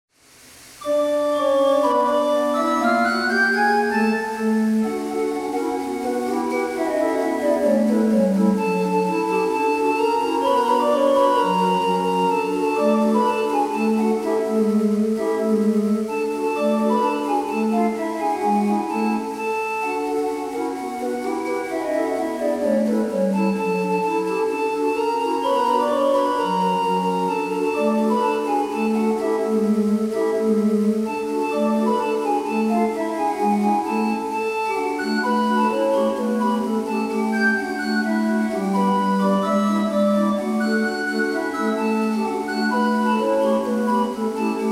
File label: Brass Whistles, Tangley Calliope